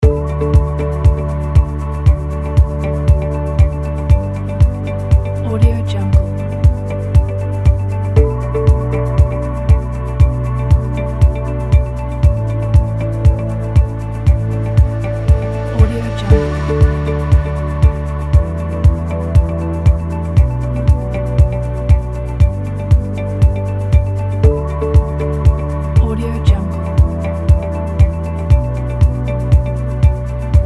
promotion ambient loop

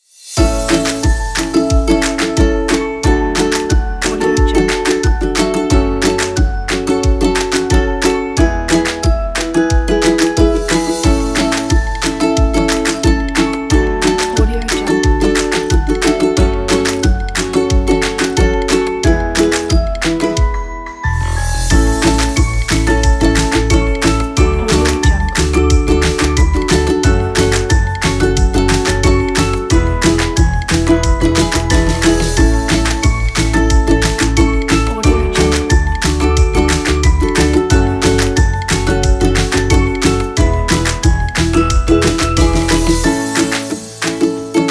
cartoon

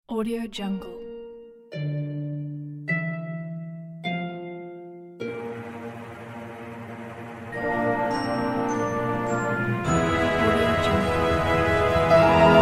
grand orchestral logo

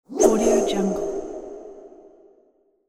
reverberated whoosh

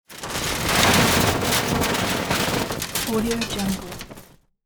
auto metal burn; prosoundfx

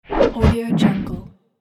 whoosh

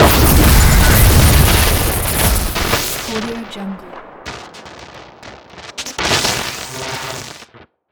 energy lightning strike; soundmorph

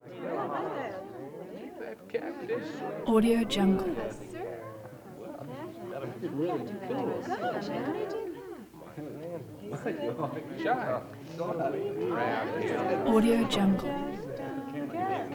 teen crowd walla interior exclamation reaction

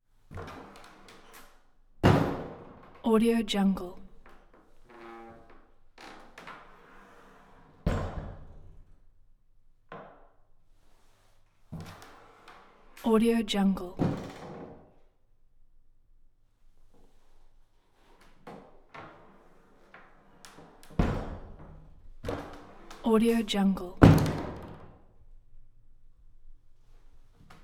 bathroom stall wood door womens room

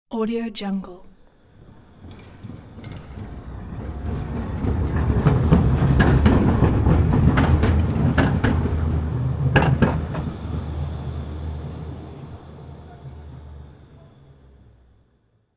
close tramway passing through crossing

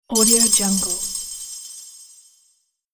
sparkling cave magic

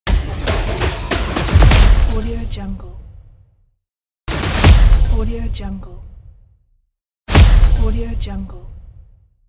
achieved

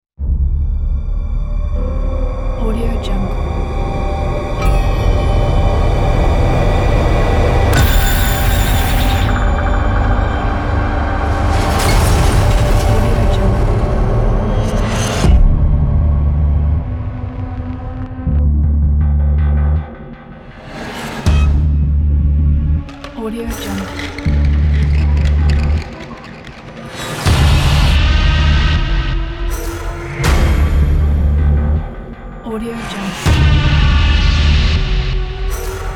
intense horror sound design trailer

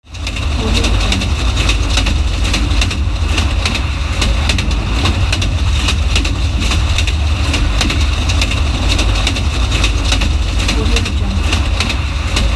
sound of train wheels

